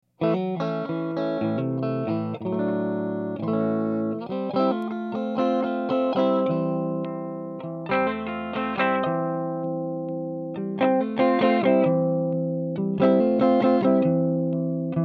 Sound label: strat raw, amps; Volume at 12 o'clock; gypsy